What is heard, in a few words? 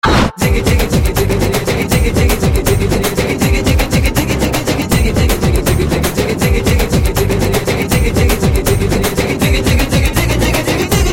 Tamil Top Tune